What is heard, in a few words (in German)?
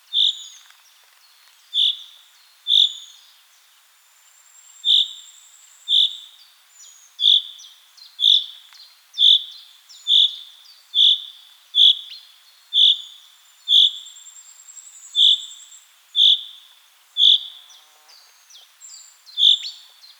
Buchfink